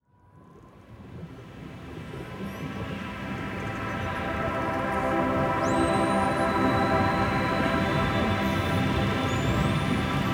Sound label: Alpha Waves